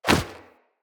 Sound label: Punch Whoosh; Weapons; Unarmed